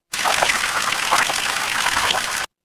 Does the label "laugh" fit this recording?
No